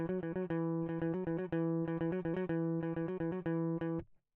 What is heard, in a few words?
electric